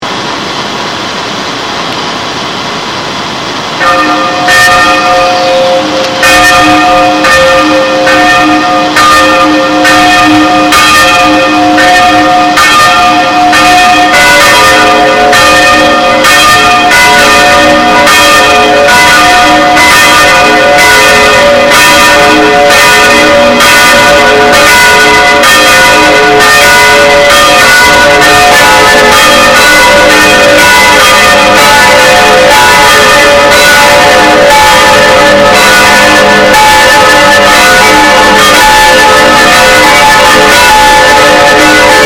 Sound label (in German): Alle drei Glocken